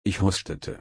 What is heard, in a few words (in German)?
husten